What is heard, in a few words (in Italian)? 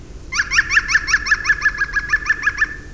Picus viridis picchio verde